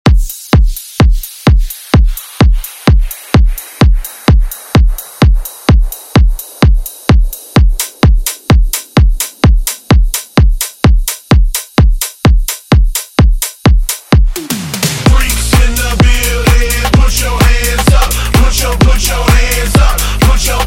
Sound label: Hype Rework 128 Bpm